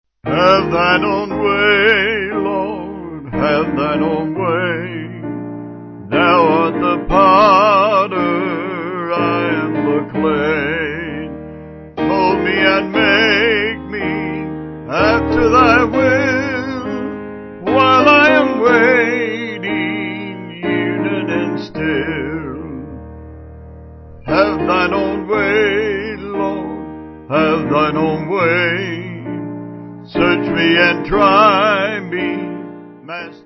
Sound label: Vocals Piano; Have Thine Own Way; Stebbins